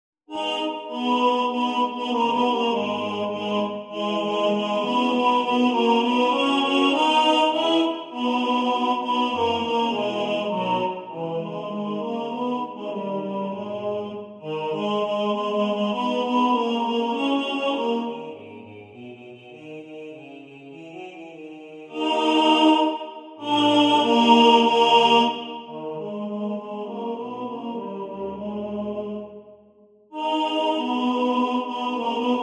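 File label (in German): Tenor2 im Vordergrund, Männerchor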